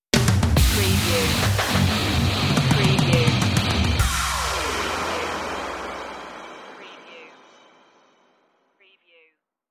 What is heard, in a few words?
dub metal stinger